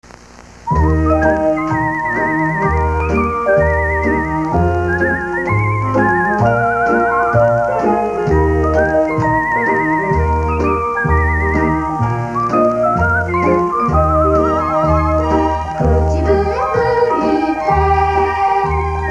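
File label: whistle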